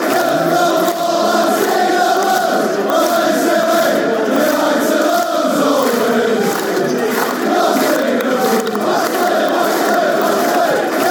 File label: singing blues fanchants